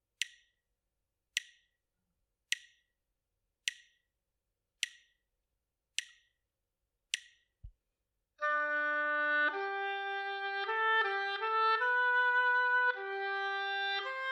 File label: partially completed melodic dictation